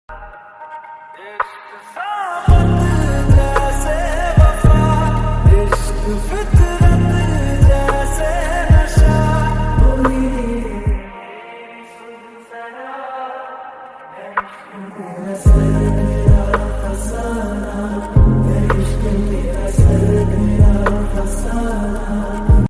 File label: lofi hindi song